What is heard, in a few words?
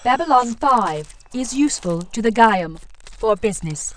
docks; Babylon 5